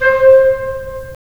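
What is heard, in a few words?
wind clarinet; LA Composer vol. 3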